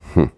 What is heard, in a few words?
Hmpf; Neighbours From Hell Console Edition; GC, Xbox